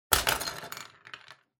Pen Holder Knock Over

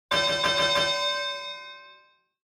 Boxing Bell

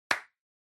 Hand Foley Clap High

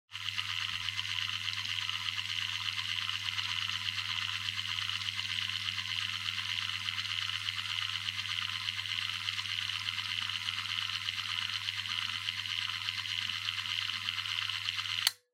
Outlet Timer Operating Noise; タイマー機器

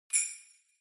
Sleigh Bells Dry Knock Gentle

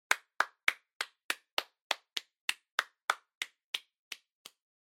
Applause Random Slow

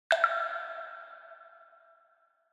Water Drop Low Reverb

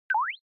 Onoma Negative Low; オノマトペ ネガティブ; 低

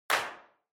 Handclap Synth

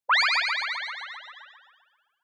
Motion Pop

